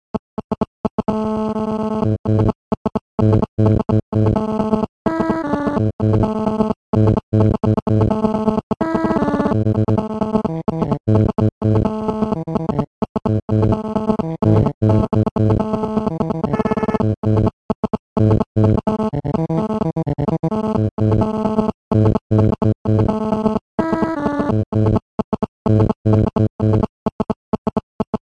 Nhac chuong tieng nhieu song dien thoai remix

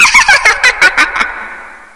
giong ma cuoi son toc gay

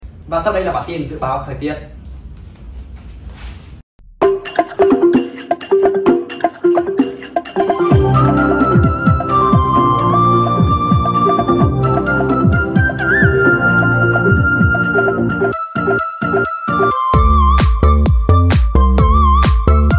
nhac du bao thoi tiet remix tiktok

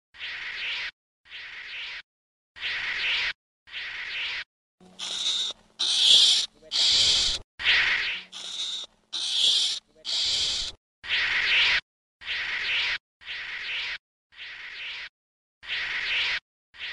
tieng chon bac ma keu

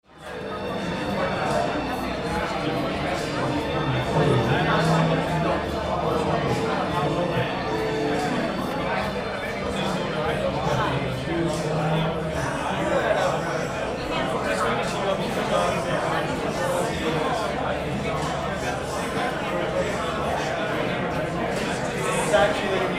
Tiếng ồn ào của nhiều người trong quán bar

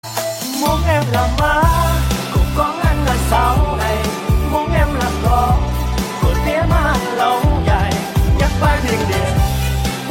Nhac chuong

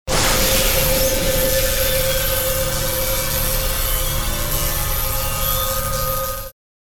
Am thanh kho bau phat sang khi su dung phep thuat

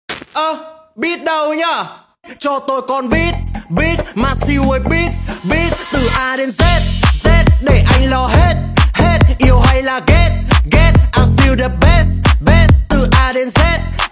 o beat con beat beat remix tiktok

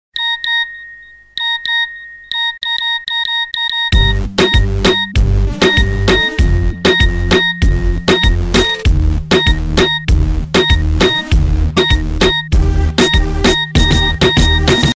Nhac chuong tin nhan den remix

